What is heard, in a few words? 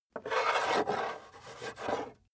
metal scrape on wood